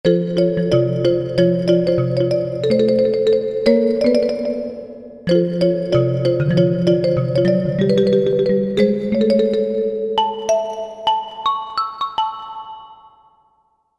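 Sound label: Stereo Marimba; Stero Marm; Korg MSC-1S Variety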